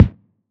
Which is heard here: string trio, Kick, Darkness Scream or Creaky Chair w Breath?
Kick